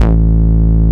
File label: Bass Root A